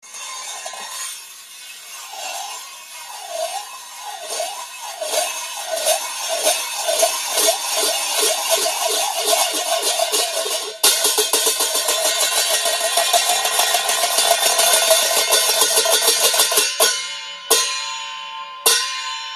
Handbecken

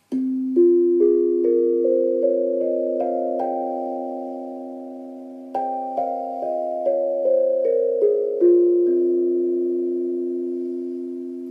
Zenko Harmony Schlägel

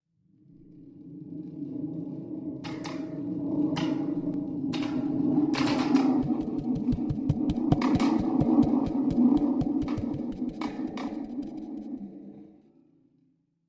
Donnergroll

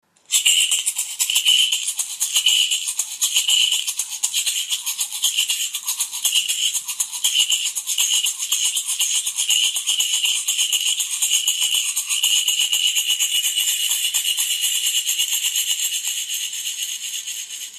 Holzshaker Geschlitzt

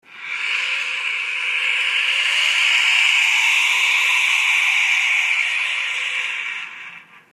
Wind, Musikinstrumente

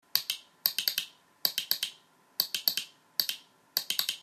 Knackinsekten

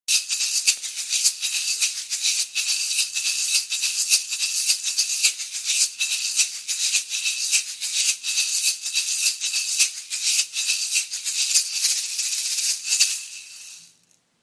Shaker Marmorei klein

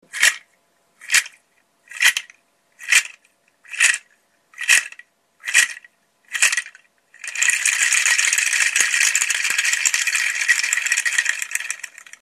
Samenrassel

